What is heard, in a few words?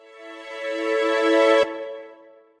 Strings rev